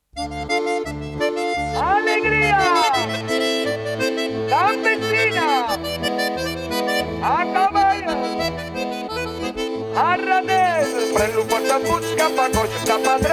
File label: canto